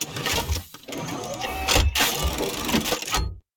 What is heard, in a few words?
reload; tacz; RSWS 1903